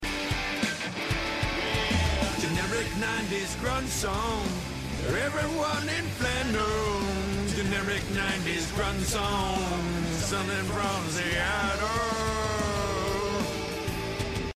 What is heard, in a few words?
Generic 90s Grunge